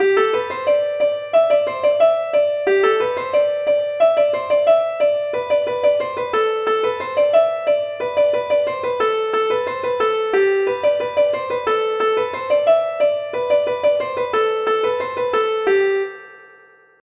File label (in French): suite ronds